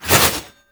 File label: Void Heavy Scythe Whoosh Small; Warframe; Duviri; Incarnon Adapters; Melee